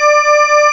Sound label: VOCAL WAVE